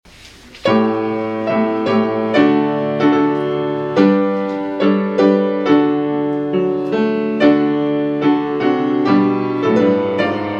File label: Hymn; Jesus, Thou Mighty Lord